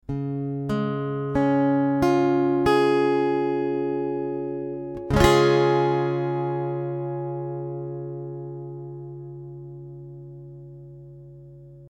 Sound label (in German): cis dur